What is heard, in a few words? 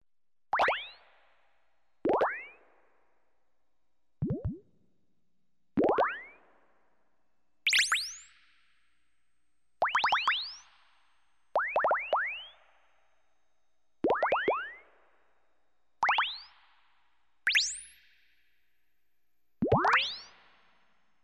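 synthesizer electronic bubbles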